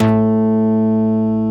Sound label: SMACK BASS